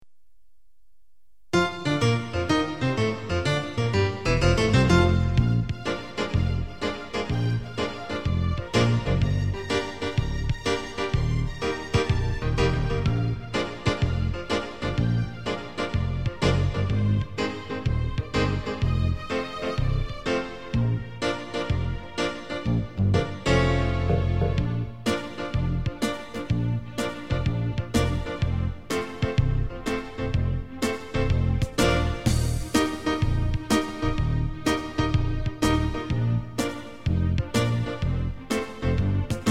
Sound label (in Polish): wersja instrumentalna